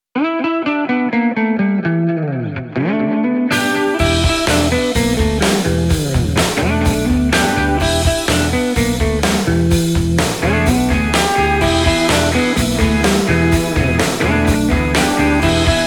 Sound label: Southern Tango; rockin southern blues